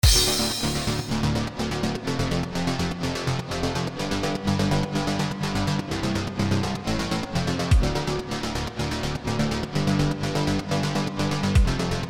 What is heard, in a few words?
Boss Background Music; Itadaki Smash